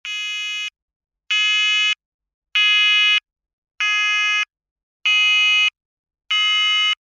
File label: alarm tone, sounder alarm tone; sounder beacon